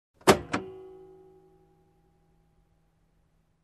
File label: Closing compartment in car